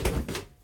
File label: door open; Golden Crayon Sounds